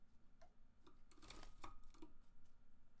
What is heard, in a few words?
canary playing in his birdcage, little yellow canary playing and chirping in his bi